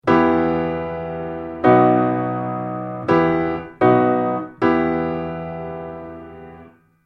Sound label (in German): akkordfolgen